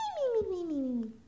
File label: snore; toadette; sm64coopdx; char-select-extra-chars